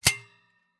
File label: Industry Vent Cover Metal Snapping